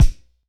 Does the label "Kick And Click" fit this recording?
Yes